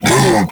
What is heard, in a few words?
Vocal Tranquilized Grunt; Warframe; Predasite; Hunting Critters; Characters